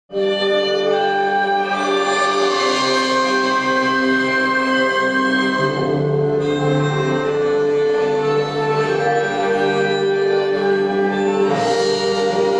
CORAL E ORQUESTRA

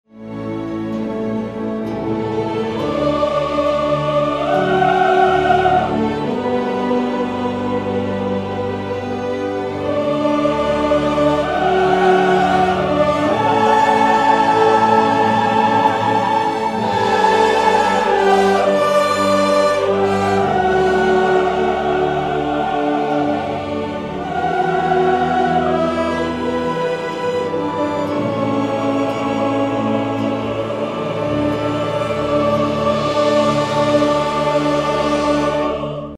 CORAL E ORQUESTRA

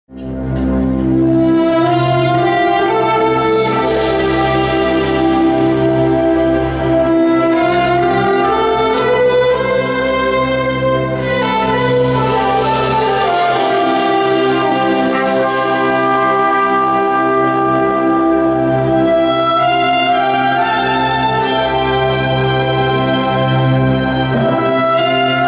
ORQUESTRA; AGNUS DEI

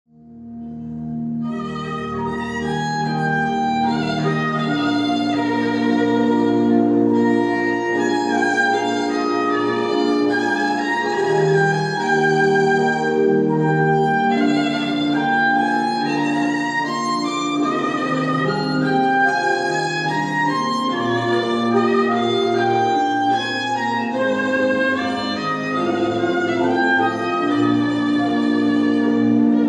INVERNO VIVALDI ORQUESTRA

